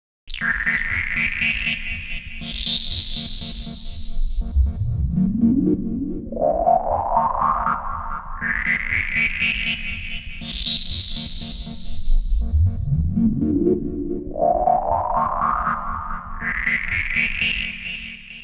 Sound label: hold chord to rise